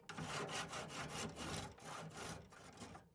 ambience room butchery working layer

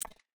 ui click cancel

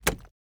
submenu corridor open

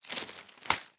ambience room shadow room working layer

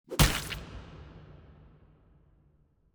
ambience room torture gulliotine cut

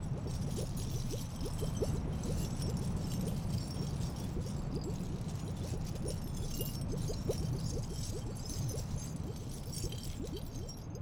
Box Flask Loop; TANK; 3Souls

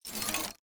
environment gate up

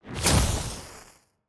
Fire Sphere Pick Up; TANK; 3Souls

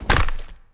Bone Golem Hit; TANK; 3Souls

